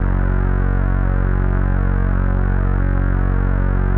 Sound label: 505, String Machines